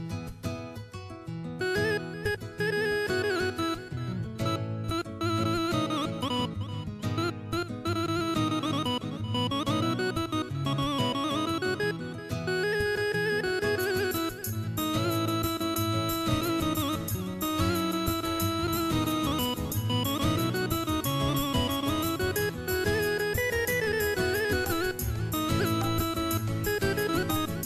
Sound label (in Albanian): Melodi Shqip